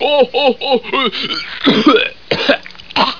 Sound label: ho-ho-ho cough